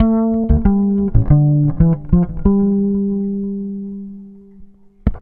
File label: Vintage Fretless, Electric Bass